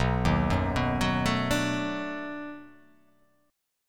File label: B 7th Sharp 9th, down